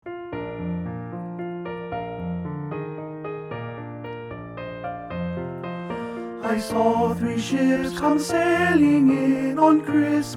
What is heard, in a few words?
Two Holiday Carols For Emerging Tenor Bass, I Saw Three Ships